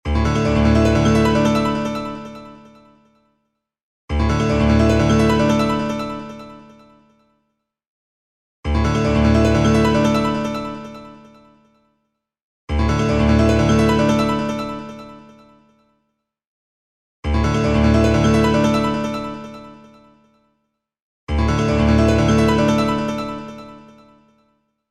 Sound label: Wave, ringtones; LG